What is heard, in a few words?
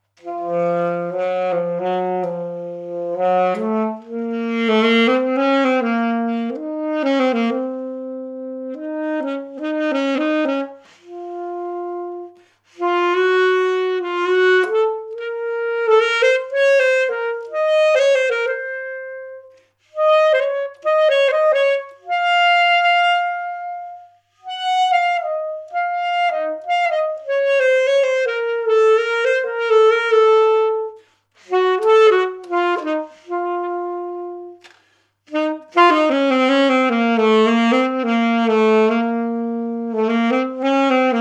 impro saxo